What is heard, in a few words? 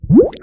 Fizz Bubble; Candy Crush Soda